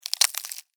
Insect, Eat, Chew, Bite, Bug; Insects Eating; Blastwave; Animals:Insects

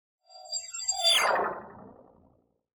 Crystal Whoosh Rear To Front; Whoosh Fast Flange Delay Production Element Imaging Element Accent Transition

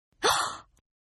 Gasp Female; Gasping; Voice

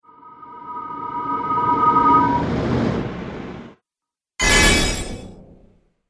Magic Spells; Magic Sound Effects

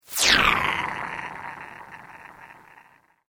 Sweep Organic Medium

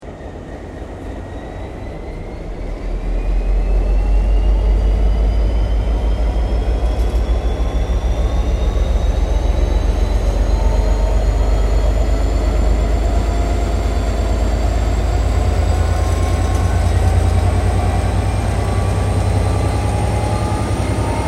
Train T Class Diesel Locomotive Powering Up; VICRAIL; Various Melbourne Trains; Vehicles

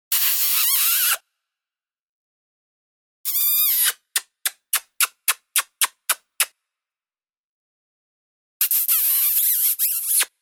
A selection of loving kisses; Kissing (Sound Effects:Human)

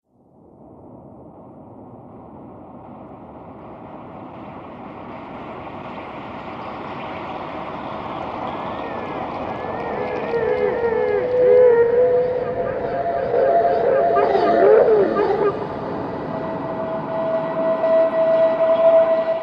Screams Horror Atmosphere, scary soundscape

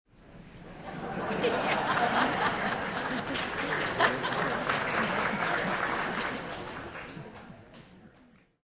Concert

